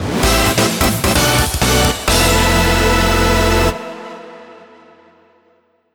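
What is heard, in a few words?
background music Stage Failed; Ginga Force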